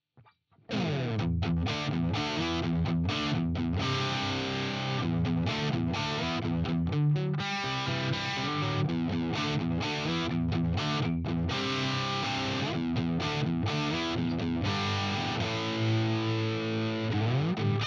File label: HB Fusion-T dist bridge